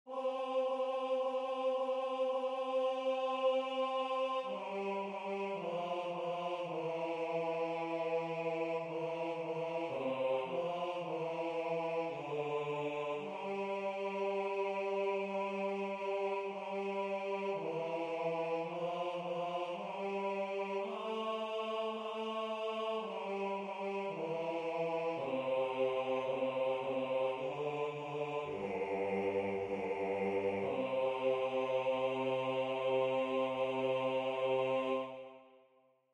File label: Bass